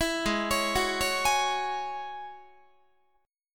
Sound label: Ab 7th Suspended 4th Sharp 5th Ab down Guitar; Ab7sus4#5 arpeggiated